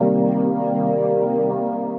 Cavity Soup Stab (minor), Pads; Ableton; Skitter and Step

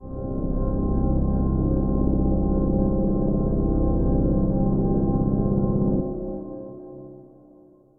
Pipe Organ Octavius; Evolving Bass; Ableton; Factory Packs; Drone Lab

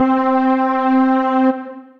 Layered Pad; Synth Pad; Ableton; Factory Packs; Synth Essentials

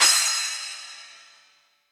Splash 8 Reso Classic, Cymbal; Drums; Drum Hits; Standard Hits; Ableton; Factory Packs; Session Drums Club

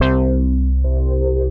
funk lead; Synth Lead; Bernie Funk Lead; Ableton; Creative Extensions; Poli

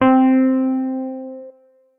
Wurly, Wurly piano; Electric Keyboards; Wurly Vancouver Ash; Ableton; Factory Packs

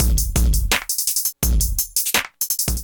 Head Smack Kit groove 84 bpm; MIDI Clips; Ableton; Drum Essentials

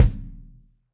Kick Felt Low Classic Muffle; Drums; Drum Hits; Standard Hits; Ableton; Session Drums Studio

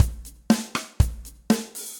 Kit Cold Tight Room, Drums; MPE Kits; Ableton; Session Drums Studio

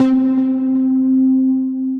Baritone Guitar; Guitar & Plucked; Drive and Glow; Ableton; Factory Packs

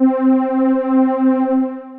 Petpad, Synth Pad; Instruments; Ableton; Synth Essentials; Operator

